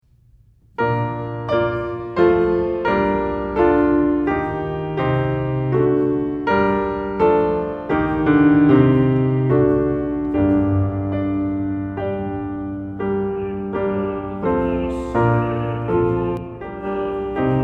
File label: Piano; Behold The Savior Of Mankind; piano accompaniment pieces